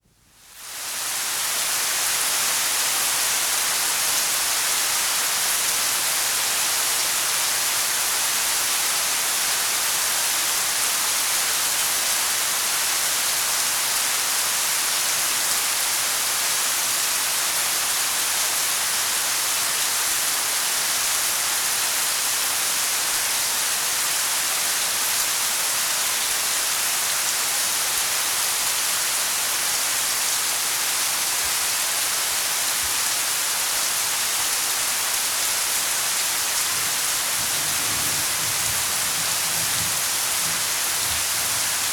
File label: Steady Hard Rain; Dr. Sound Effects; 210 Nature Sounds - 20 Hours of Relaxing Natural Ambiences for Meditation and Sleep